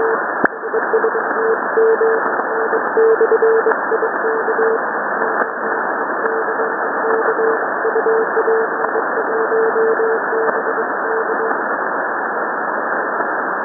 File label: sending random prefixes